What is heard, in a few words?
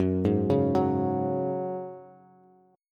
Gb Suspended 2nd, Bass; arpeggiated; 14,11,11,11